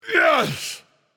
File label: yay; Smite; atlas